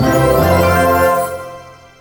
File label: Combo; SFX Ingame Combo 5